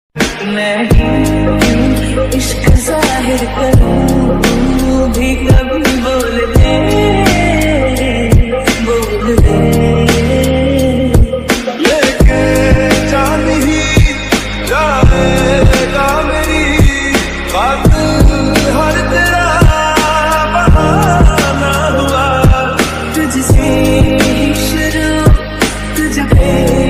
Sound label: slowed reverb ringtone, sad ringtone